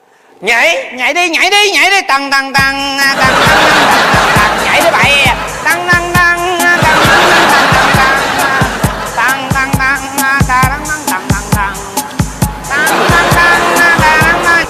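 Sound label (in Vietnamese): Nhạc chuông nhảy nhảy đi mày tang tang tang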